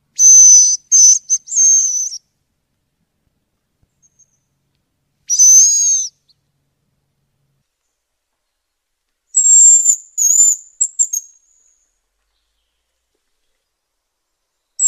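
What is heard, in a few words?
forest whistle of hazel grouse close up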